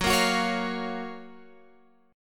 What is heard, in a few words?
Gb Major 7th Gb Guitar Open G